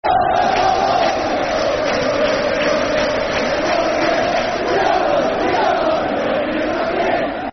E-I-E-I-Ei-O [Football Chants (Soccer Songs)], Barnsley